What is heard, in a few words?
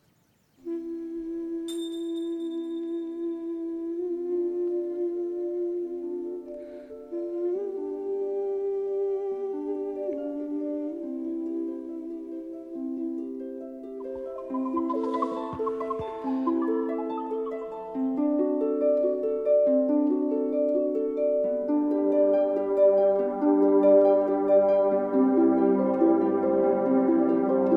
C Major Prelude Meditation